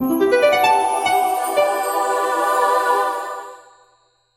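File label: fanfare and solemn award ceremony sounds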